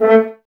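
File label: Brass French Horns A; Sample CDs; Miroslav Vitous; Woodwind Brass Ensemble; F.Horns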